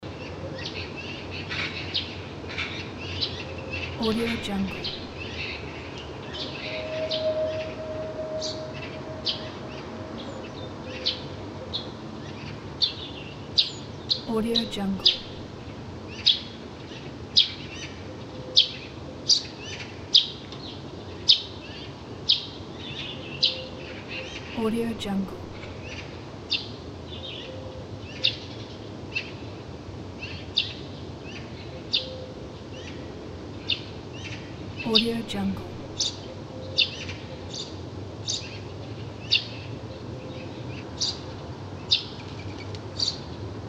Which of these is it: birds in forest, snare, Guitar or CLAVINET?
birds in forest